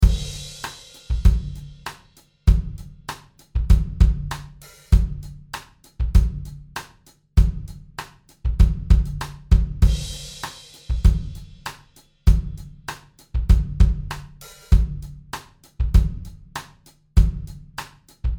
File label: pop drum live room studio; sunset sound